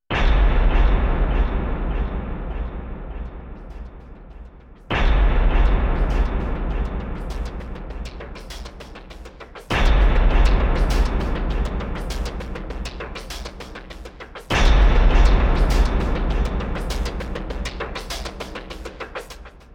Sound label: percussion only